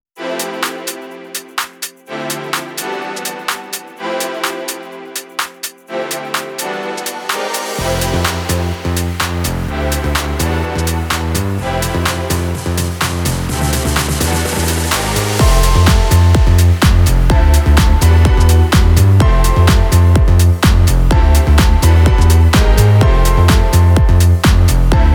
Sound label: Trance